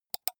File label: computer mouse left click single fast